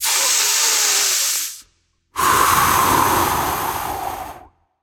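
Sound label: breath, non-verbal; voicepack; smite; merlin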